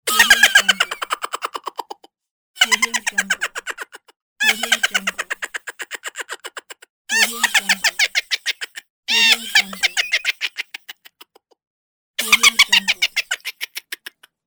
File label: cartoon character hilarious laughing